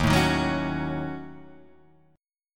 D sharp Minor 7th Flat 5th D sharp down Guitar Half Step Down